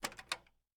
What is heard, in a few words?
Cassette Open; This Is the Police